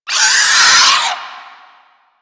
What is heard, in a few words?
arm vocal wind up; Warframe; Man In The Wall